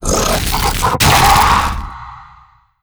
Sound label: vox run warning, zombie revived